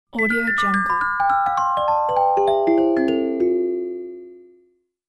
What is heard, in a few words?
magic descending ring bells; game